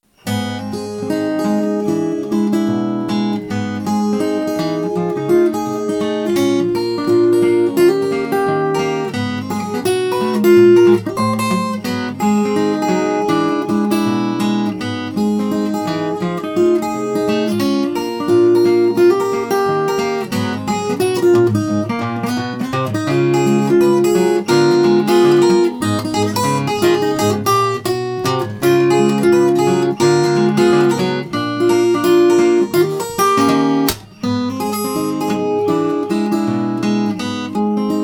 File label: Swing